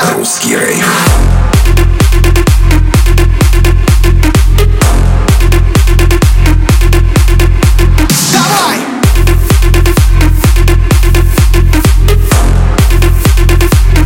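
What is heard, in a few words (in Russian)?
русский клубняк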